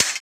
hit; breaking falling hit sfx

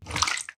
slime; water splash slime sfx

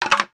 wood falling; wood metal SFX

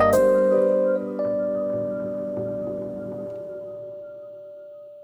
Rhodes Fade; Pads; BB 2HTC Samples Vol 4 Addendum

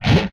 Explosion, retro arcade; MMRetroArcadeSoundsPack

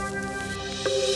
Reverse, Drums; BB 2HTC Samples Vol 4

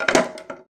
wood misc, wood metal; wood metal SFX

